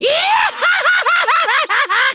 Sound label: laugh